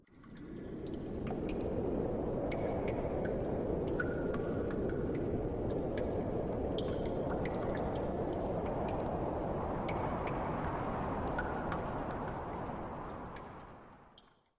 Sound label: drops, Mines; AMB; OneShot Fading Memory